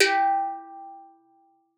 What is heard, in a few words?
steeldrum G3; Xsample VOL-4